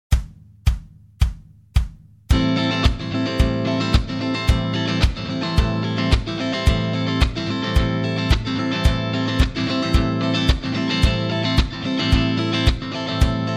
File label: Latin fast